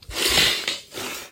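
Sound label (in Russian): ударный стальной прут при строительстве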